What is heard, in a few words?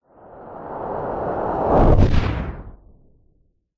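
science fiction spacecraft whizz by fast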